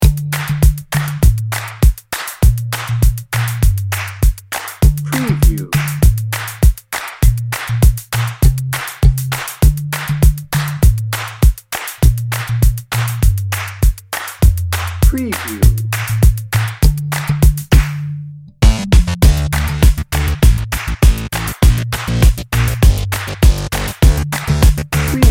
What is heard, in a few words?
friendly vibes dnb